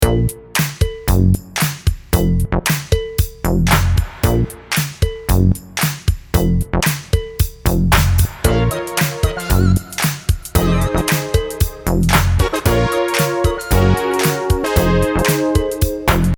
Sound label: funk synth